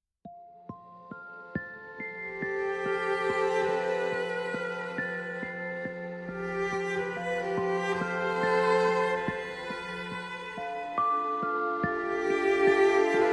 cello melody

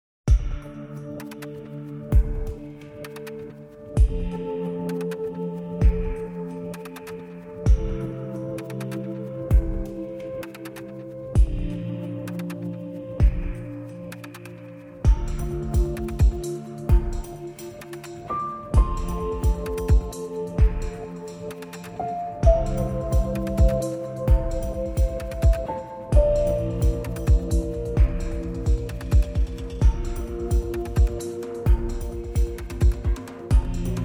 Exciting Trip without bassline

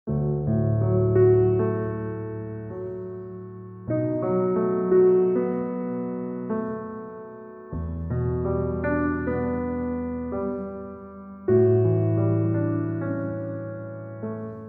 Piano Miniatures Peaceful Landscapes

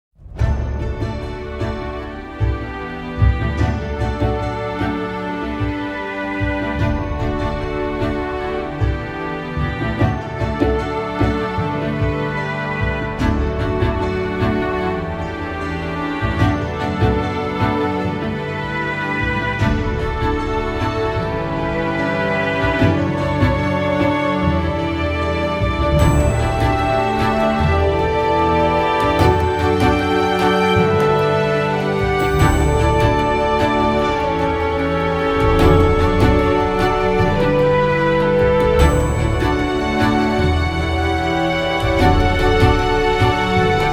Morning Dawn

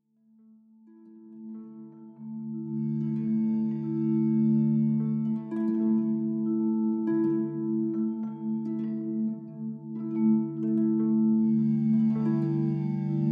Autumn Rains without beat

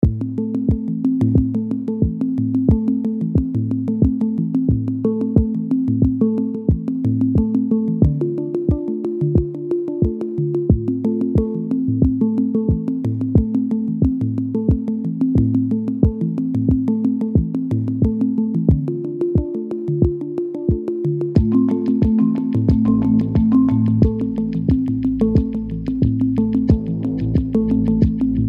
Sine Pattern Bed Reduced

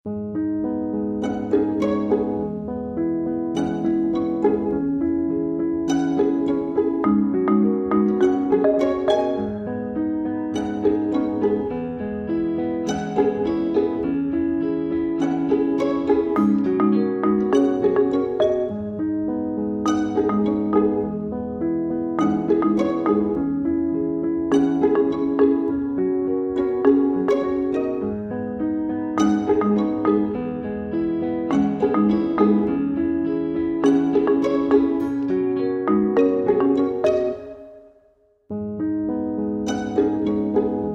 Cheeky Chong ohne Beat

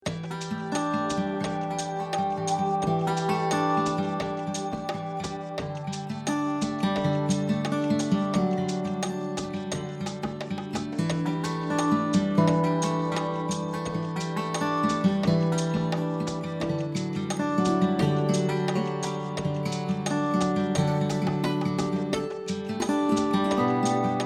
Breathe Deep

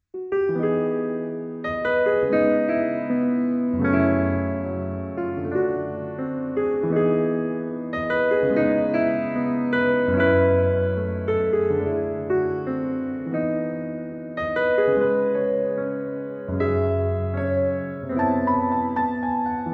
Smooth And Jazzy

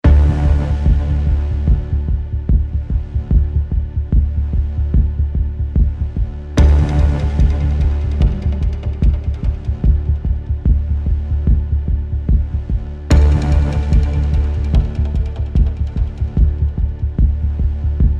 Tense Rising

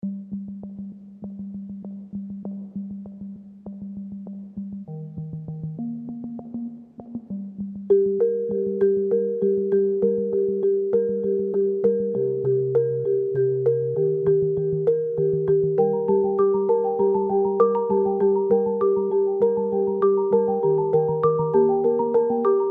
Doublings

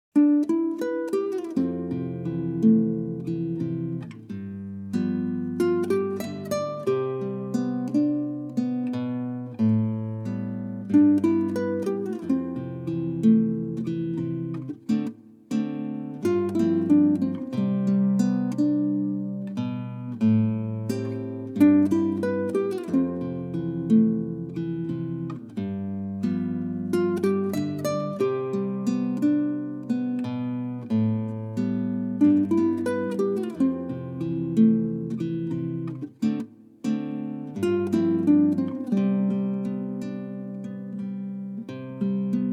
Playing A Nice Guitar Tune

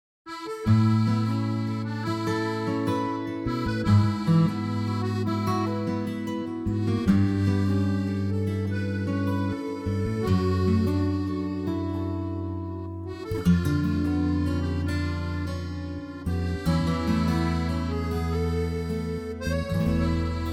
Frisian Life different accordion

